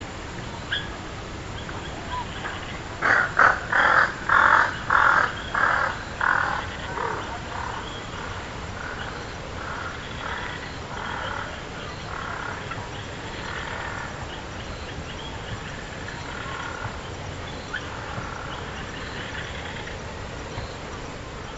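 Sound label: little egret voice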